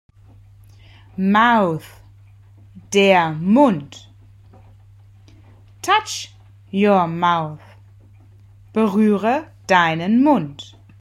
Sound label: mouth